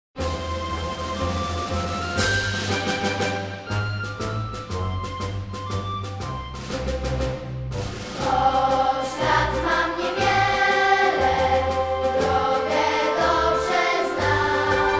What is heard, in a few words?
hymn